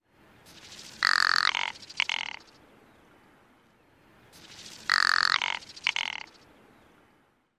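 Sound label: rock ptarmigan guttural rattle repeated twice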